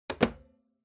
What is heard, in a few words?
Camera Instant Put Down